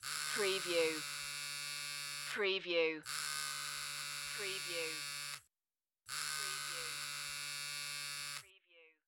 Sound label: Pager Vibrate